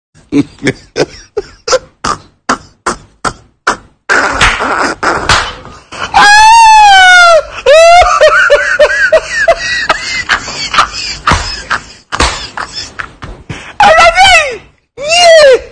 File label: Mocking Laughter; African; Meme